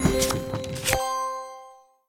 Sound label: buffer action; CosmicRage